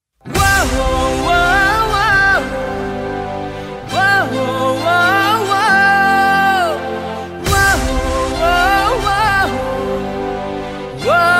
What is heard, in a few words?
BG Humming